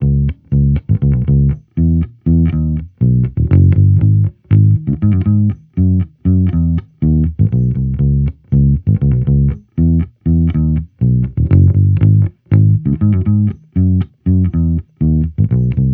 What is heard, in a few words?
Amped loop 120 Dm, Electric Bass Loops; Slate Digital BASSHOLE Sample Pack